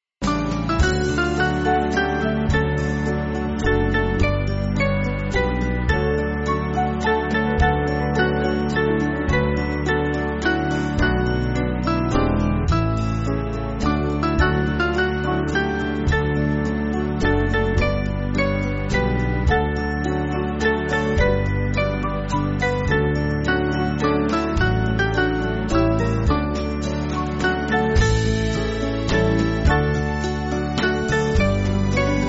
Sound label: Band, Backing Files for Churches